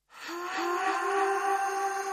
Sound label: Voice